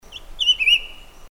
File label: White whiskered Laughingthrush, birdcall; Garrulax morrisonianus; song?; Bird Call Recordings